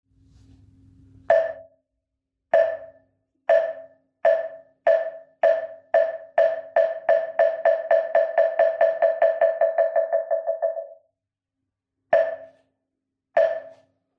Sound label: Moktak; kumarrukset; Ydinsuutra koreaksi